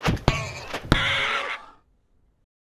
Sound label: cardboard kaiju assault punch